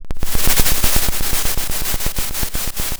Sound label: pop, Sound of a drum roll